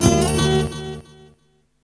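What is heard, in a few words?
guitar, Hip Hop R n B; USB Soundscan vol.19; AKAI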